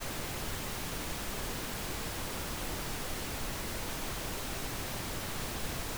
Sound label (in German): rosa rauschen